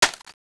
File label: push, HK416c